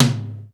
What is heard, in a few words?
DRY T, drum; Crypton; DrumBase5000